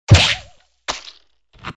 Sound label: drop sandbag